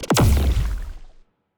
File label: evasion buff hit, weapons; Stellaris; biogenesis